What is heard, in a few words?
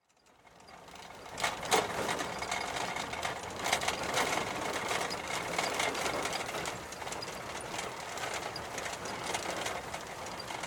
Auto Interior Driving On Bumpy Gravel Road Heavy Rattle; Blind Drive